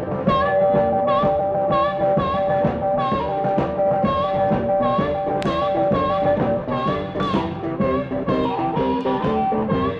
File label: Polyfonic signal; Separation of Sound Sources by Convolutive